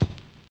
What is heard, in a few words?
RAGGA; Spectrasonics; Metamorphosis; RAGGAVYNL